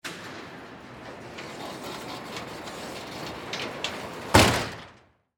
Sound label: Service Garage Door, Close; Garage Door Sound Effects